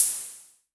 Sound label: Hi Hats Open; LIMAT Div Dept